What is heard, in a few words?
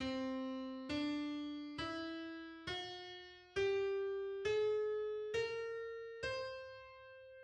Acoustic scale just